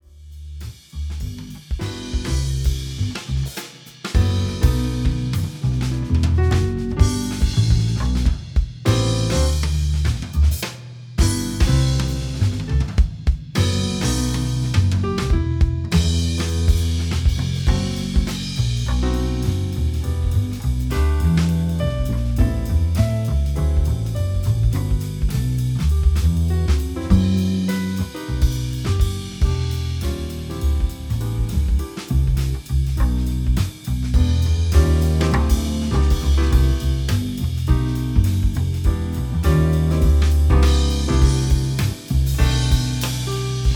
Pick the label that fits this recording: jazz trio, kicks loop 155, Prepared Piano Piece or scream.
jazz trio